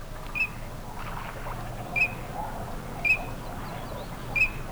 anas crecca

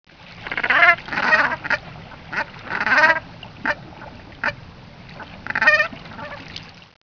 branta bernicla

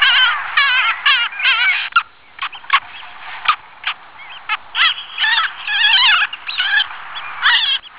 stercorarius parasiticus

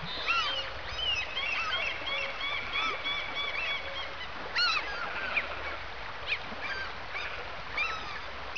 larus canus

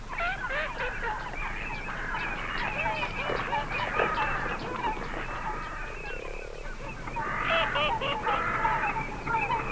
anas crecca female

